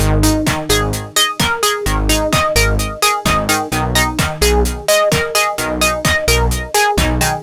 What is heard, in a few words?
129 Full No Bd, 129bpm; Zero-G - Soundclash; Dead And Alive